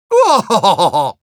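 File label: laugh short, Russian; Spy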